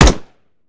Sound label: mike4 fire, weapons; gmod; viper